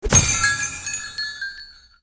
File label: dizzy bomb pickup